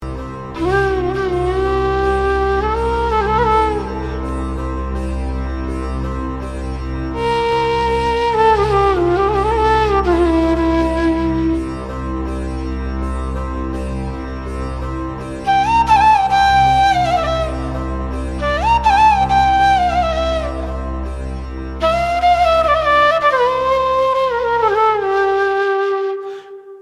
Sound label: indian flute